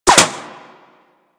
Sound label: Bullet Impact Metal; Warframe; Weapons